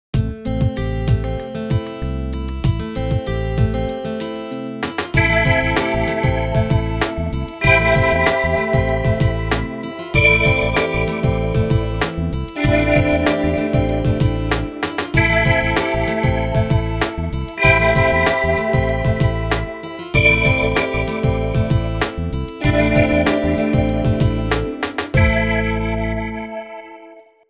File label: Neo Futuristic; Vivo X5 Pro; ringtones